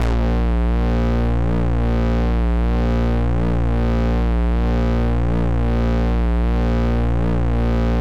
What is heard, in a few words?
Level Start